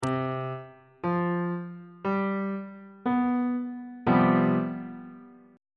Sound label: c major 7th suspended 4th chord on bass clef